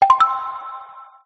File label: finish Test